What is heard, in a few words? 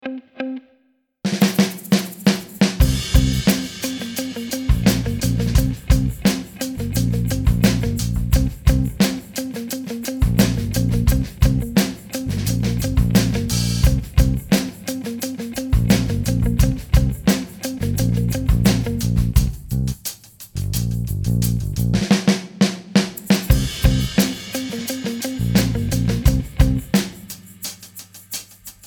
Funk